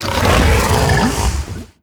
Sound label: idle in, barnacle; Half Life Alyx